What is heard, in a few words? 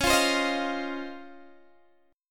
Db Major 9th, Guitar, Open D